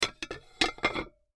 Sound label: Plates Stack Dish, Plates Stack Dishes; Dishware; Household; Blastwave